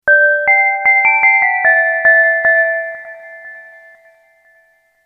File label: message christmas ringtone